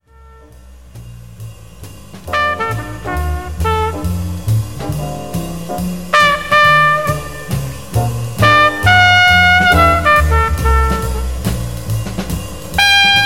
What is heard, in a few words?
jazz